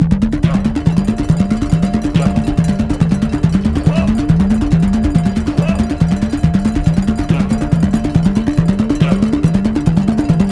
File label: Spoon Clap; rainbow laser disco dungeon